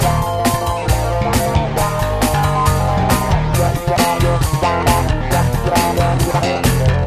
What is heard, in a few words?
beer commercial